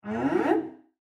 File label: Cello Slide Up; SoundsCrate